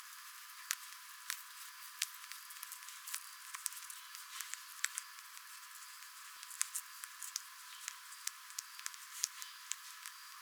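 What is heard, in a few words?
Diplomesodon pulchellum clicks juvenile; Piebald shrews; Blind four-days-old pups produce soft clicks in the human hearing range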